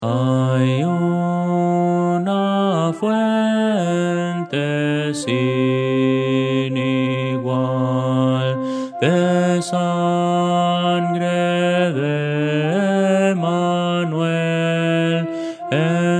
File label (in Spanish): contralto; hay una fuente sin igual; Voces para coro